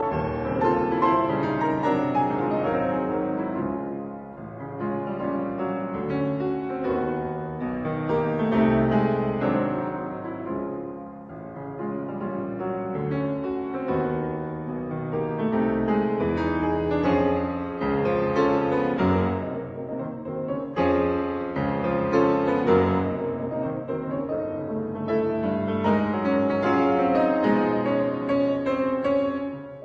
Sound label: Piano Sonata in F sharp major, Prestissimo volando